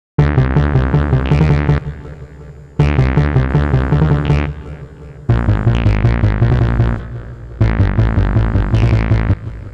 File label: seq mono fire and ice; the meditation soundset